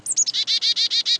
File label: chick